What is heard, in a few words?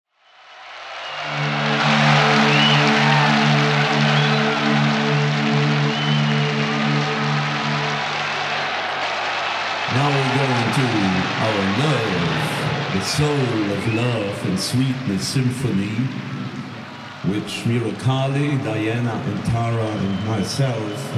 Chant; I Welcome You All